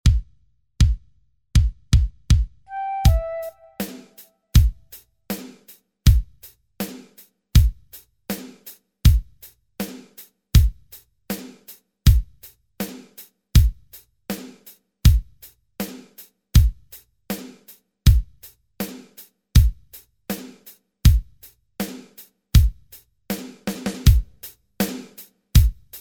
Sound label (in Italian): base senza melodia